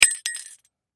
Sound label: Jingle Bell Drop Cement Christmas; Holiday Sound Effects; FOLEY